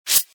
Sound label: sha